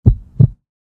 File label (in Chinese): 心跳音效